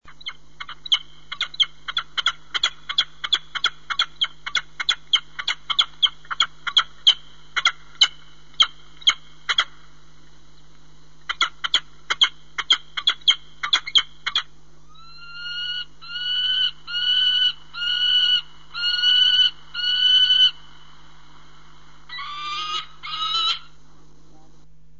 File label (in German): wanderfalke